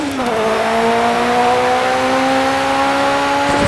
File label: third, vehicles cars; lwcars; ford rs200